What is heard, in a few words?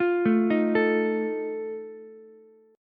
D sharp Suspended 2nd Flat 5th, Ukulele; arpeggiated; JGuitar